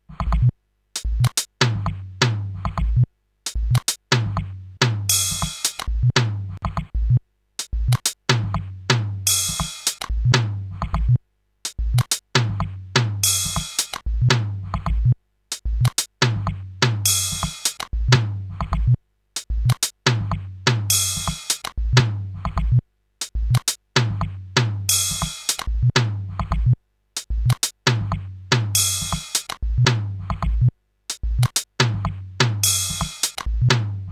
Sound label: stereo beats loop; rauschpartikel